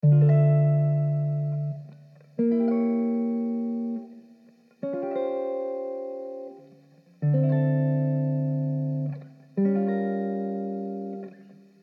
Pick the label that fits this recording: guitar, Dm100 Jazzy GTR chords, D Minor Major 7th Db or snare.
Dm100 Jazzy GTR chords